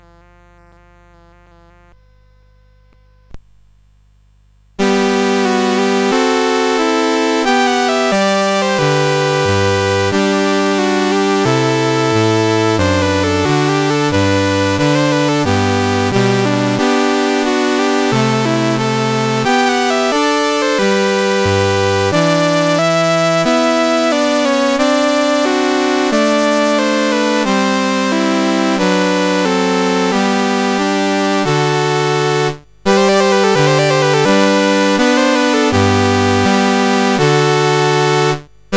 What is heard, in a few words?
National Anthem of Guinea, CSG8580R5